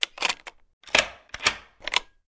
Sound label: door open; FEAR; doors